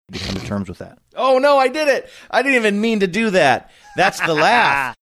snorting saying oh no i did it i didnt mean to do that